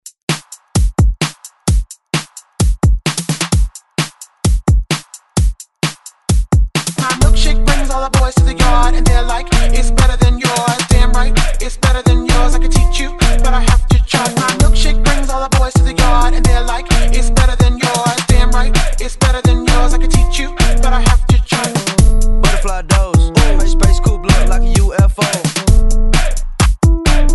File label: Upbeat Remix 130Bpm